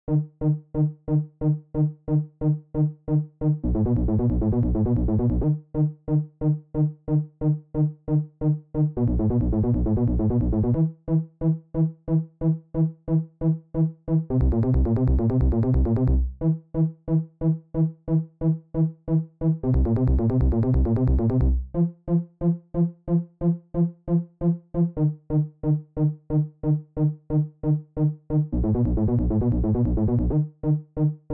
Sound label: 90s Bass ARP; Bully